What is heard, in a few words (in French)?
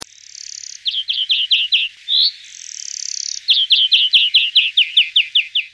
verdier; le verdier d'europe chant 02